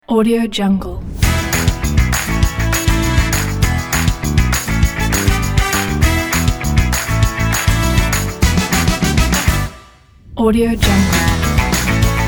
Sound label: happy rock